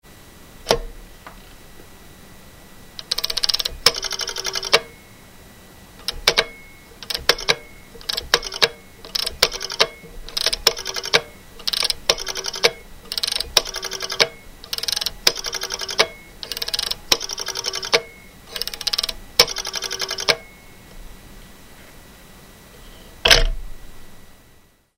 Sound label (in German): türkis Telefon